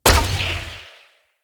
Hunter Bow Ranged Shot Charged; Weapons; Player; Envoy; Bows

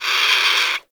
Tranq Dart Grunt; Characters; Warframe; Hunting Critters; Avichaea

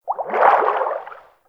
Personal Quarters Fish Tank Movement Quick; Warframe; Hub; Liset Personal Quarters

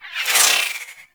Projectiles Whizzby, weapons; Broken Frame Warfan

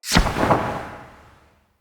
Bullet Jump B; Characters

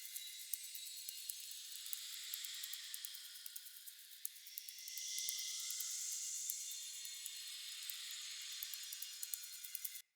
Talisman Courage Butterfly Loop; Cosmetics; Talismans; Founders Talisman

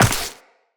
Step Ice, Footsteps, Walk; Characters; Tenno

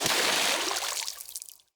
Impact Debris Medium Water; Weapon Impacts

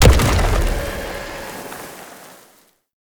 Octopede Unearth Proj By Explosion; Warframe; ManInTheWall

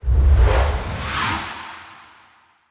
Decoy Cast; Fey; Saboteur

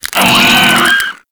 Desert Skate Vocal Pain; Warframe

